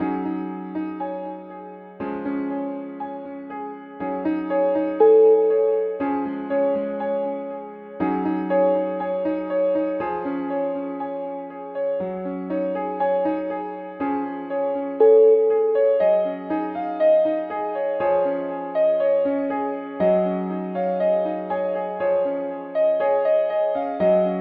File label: air piano